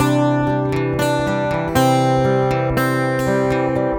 Acoustic A, акустика

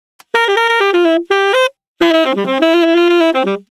Sax Solo; Disco House

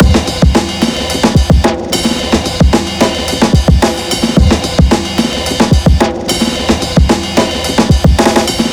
Drum Set, downbeat electronica; ударные и барабаны

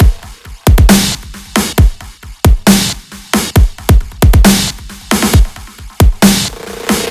Delay Kit, dubstep drum; ударные

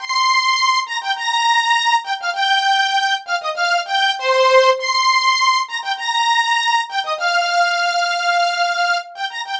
Ensemble, струнные

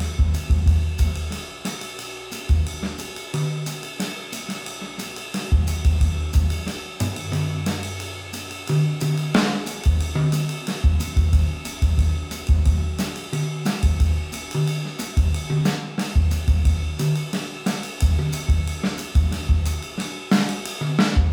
drums

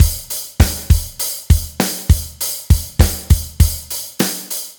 Crystal Drums

